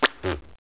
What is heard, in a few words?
Spit; Bible Fun Pack; Education Publishing Concepts